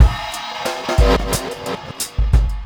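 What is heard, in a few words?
reggae drum FX; Best Service Advanced Media Trax Modern Composer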